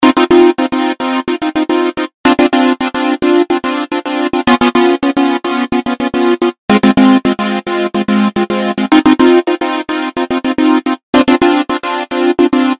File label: gated synth